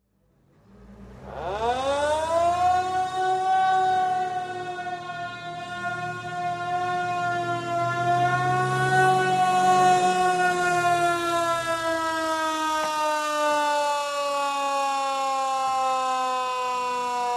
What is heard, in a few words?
Fire Truck By